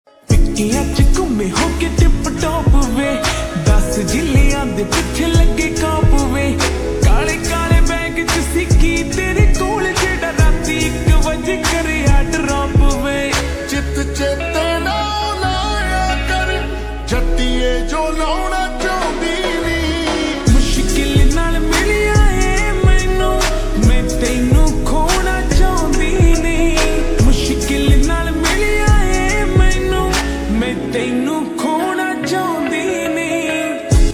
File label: Ringtone Slowed Reverb